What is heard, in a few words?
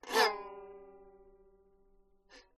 violin staccato condenser; aston origin